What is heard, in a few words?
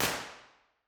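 council chamber impulse response, York Guildhall council chamber; b-format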